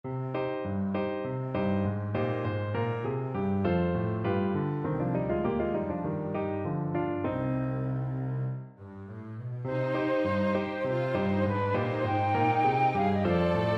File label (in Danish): Ruti akkompagnement uden alternativer